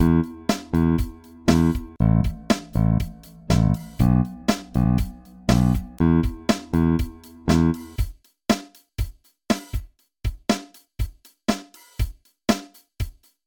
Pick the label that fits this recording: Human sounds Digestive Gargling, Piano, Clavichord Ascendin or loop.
loop